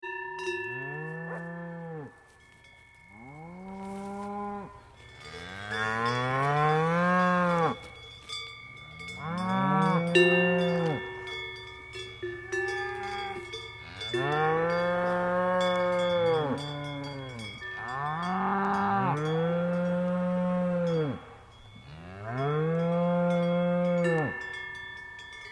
Vaches Cloches

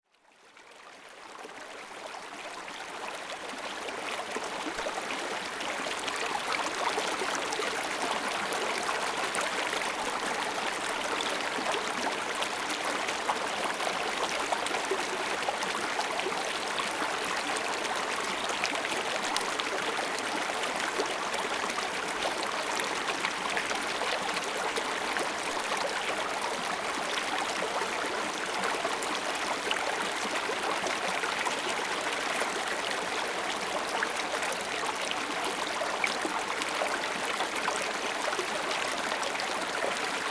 Ecrins La Pisse

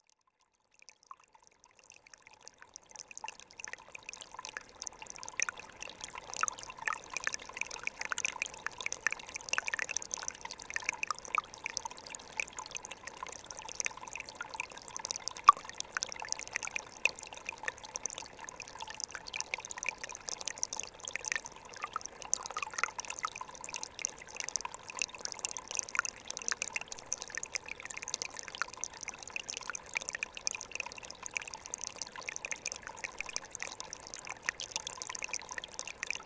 Ruisselet; Ruisselet 1

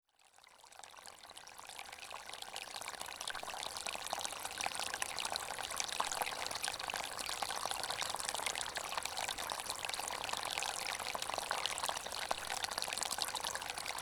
Ruisseau 1700m

